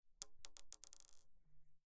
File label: tusq, acoustic guitar; Pindrop